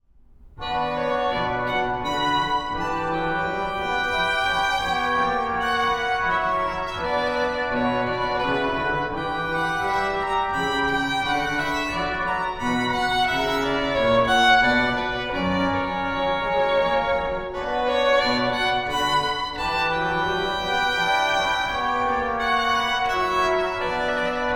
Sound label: menuet; bach